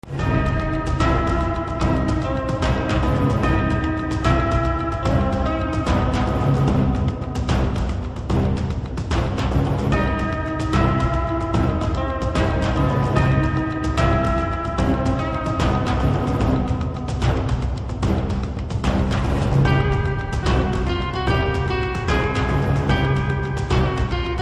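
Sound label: background music Theme Ringtone; Nani; V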